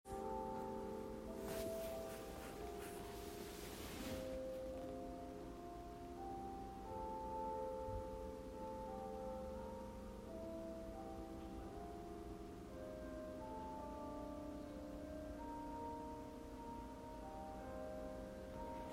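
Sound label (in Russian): церковные колокола Рождество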